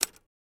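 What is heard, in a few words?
Typewriter Single Key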